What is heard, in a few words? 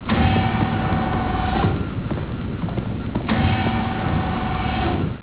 DOOR